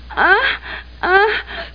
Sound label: MOAN